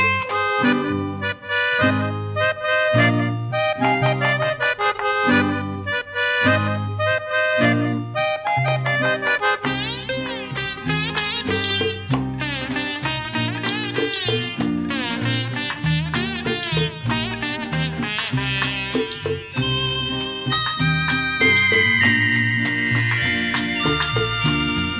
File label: Instrumental